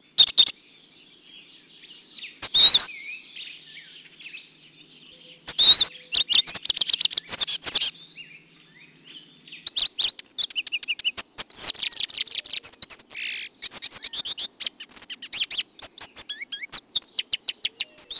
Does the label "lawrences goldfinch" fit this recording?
Yes